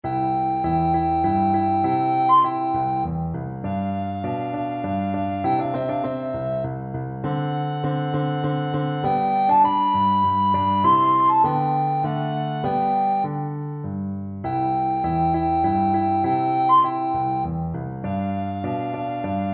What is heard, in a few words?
Minuet from Don Giovanni, recorder